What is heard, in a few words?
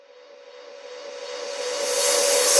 Reverse Fx; musicradar